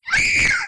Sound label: scream